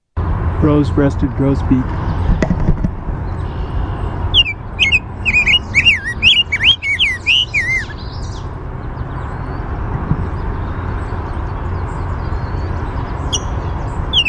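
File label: chink song, rose-breasted grosbeak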